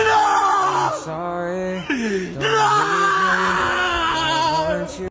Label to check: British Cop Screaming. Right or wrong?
Right